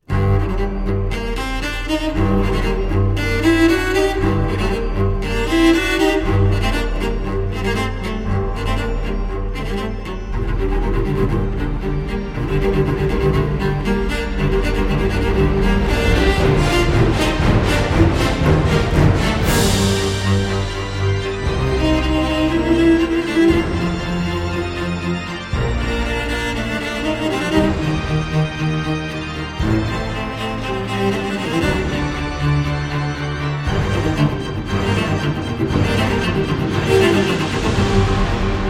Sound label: Double Cello Concerto; Vivaldi; Mvt 1